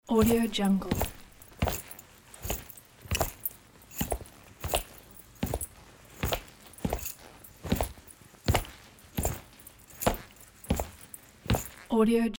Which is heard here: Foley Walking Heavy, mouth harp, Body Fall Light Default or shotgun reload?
Foley Walking Heavy